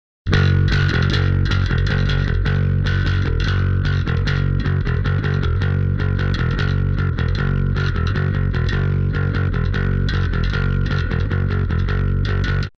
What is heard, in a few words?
BASS